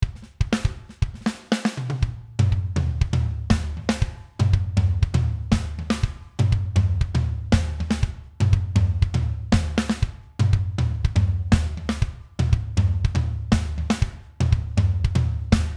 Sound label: Steven Slate Drums 5.5; Deluxe 2 Hugo